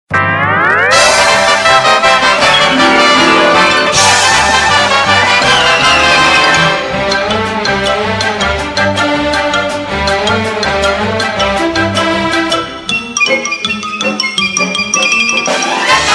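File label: sms cheerful melodies cheerful sms melody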